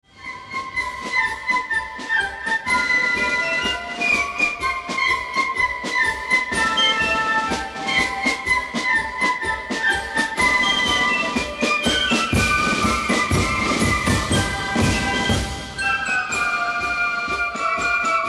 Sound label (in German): Schwarze Amsel Polka Spielmannszug Jaegerkorps; Knesebeck